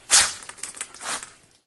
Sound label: Dire Rat Scratch; SyrinScape; Madness in the library